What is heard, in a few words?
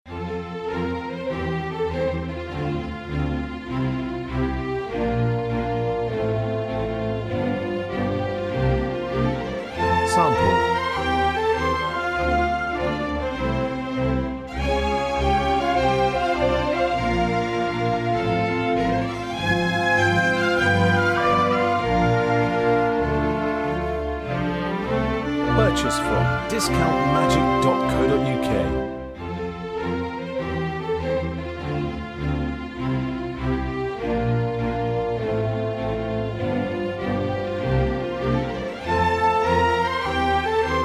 classical